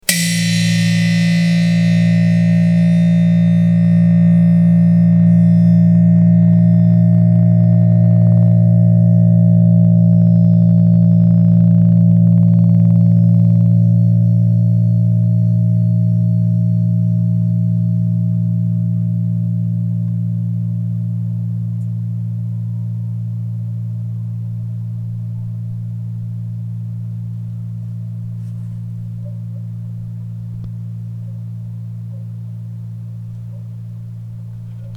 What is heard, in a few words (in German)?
Stahlsaite